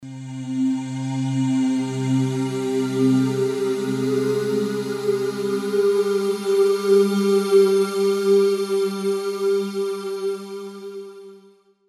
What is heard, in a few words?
hydrasynth astral float